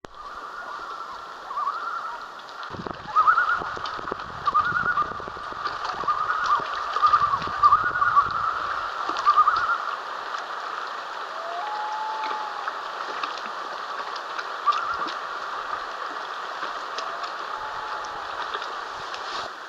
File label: loons lake; palmer lake atlin; common loon